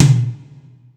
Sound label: taiko soft hit finish